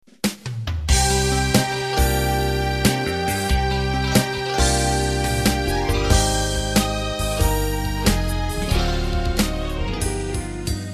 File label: Slow Rock Intro